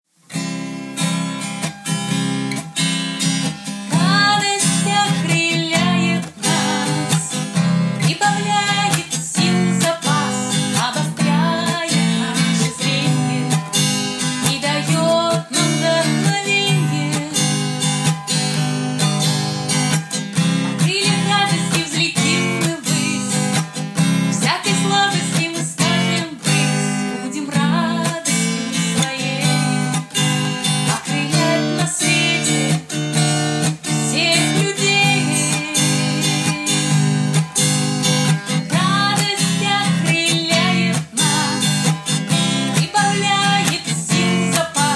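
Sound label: Song, Russian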